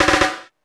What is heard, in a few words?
Snare Fill